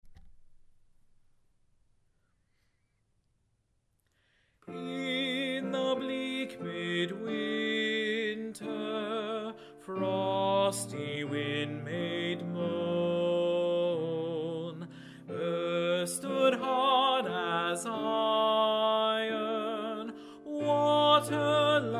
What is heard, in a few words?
Tenor; In the Bleak Midwinter, G. Holst